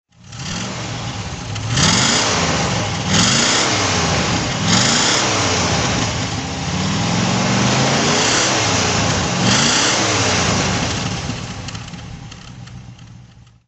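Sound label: rover p5b throttle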